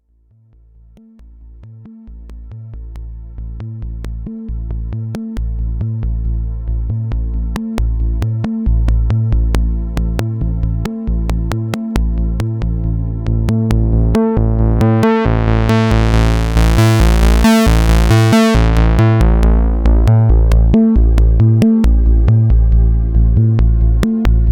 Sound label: pro1, synthesizers